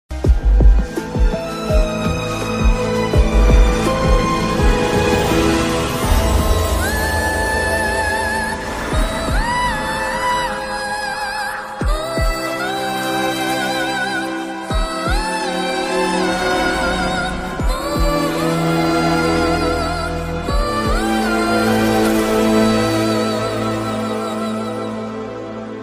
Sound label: movie bgm ringtone; kolai; vijayantony